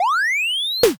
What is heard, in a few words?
defib